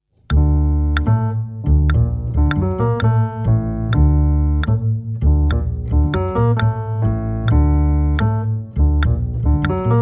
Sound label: Rumba De Mambo